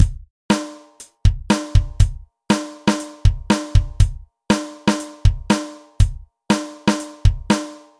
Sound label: drums